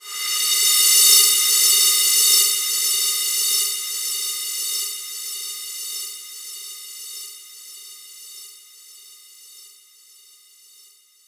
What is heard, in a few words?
Deep Breath; AMG - Prototype Drum & Bass